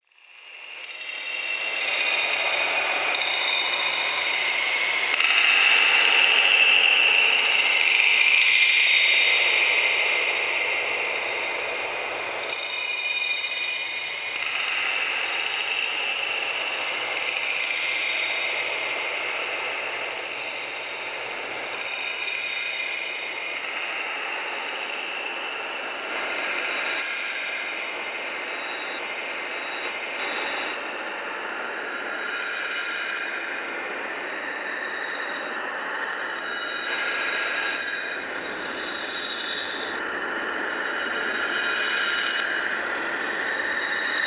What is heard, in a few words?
Echo